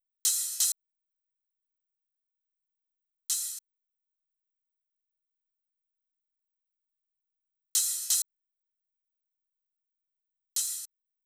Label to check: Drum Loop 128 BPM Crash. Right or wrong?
Right